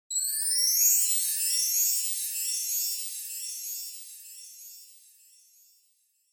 Wind Chimes Long

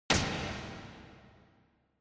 Motion Grab Reverb; モーション 掴む06-3(リバーブ)

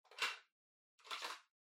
Portable Cassette Far Open Eject

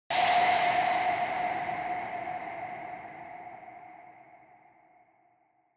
Single Accent Low

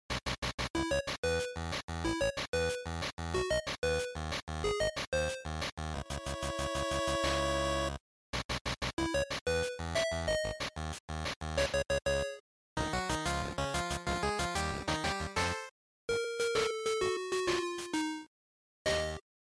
GB Fighting

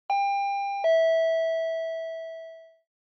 Intercom Door Station Ding Dong

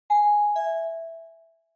Doorbell Fake Far; ドアベル フェイク; 遠い

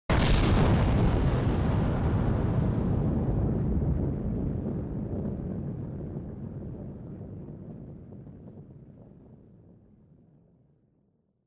Explosion Long

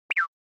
Anime Motion Low

